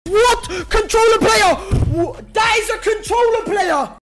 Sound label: controller player sound effect